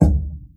kickdrum sample d key, bass drum one shot tuned to the D note